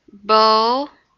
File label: bowl